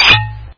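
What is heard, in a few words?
cork pulled from a wine bottle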